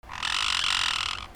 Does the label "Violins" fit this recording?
No